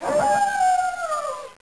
dog howl

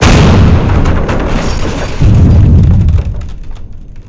metal explode

